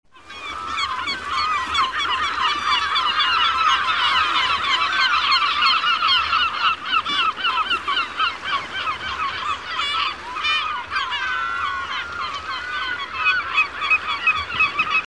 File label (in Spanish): gaviota patiamarilla